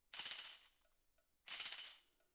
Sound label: Acoustic Percussion